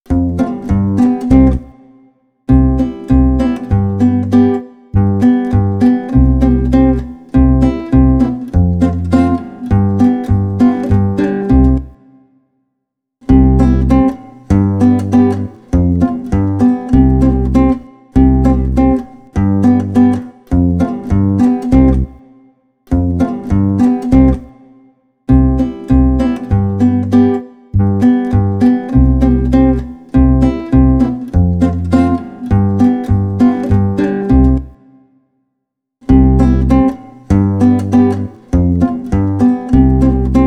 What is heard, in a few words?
Instrumental